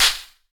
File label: slap